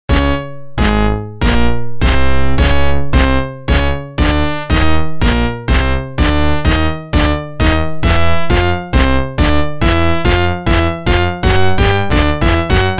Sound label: Organ